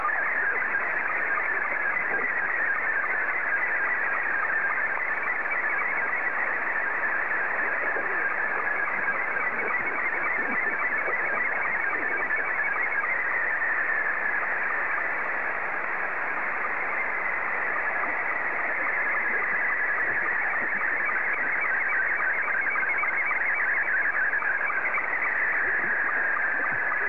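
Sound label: SSTV, Scottie 2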